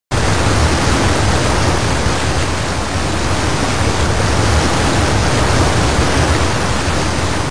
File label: river